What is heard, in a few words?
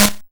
Snare, YM, CHIPSHOP HITS DRUMS; ChipShop Deluxe